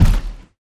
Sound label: footstep; anhur; smite; anhur skin13